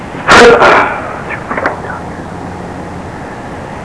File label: evp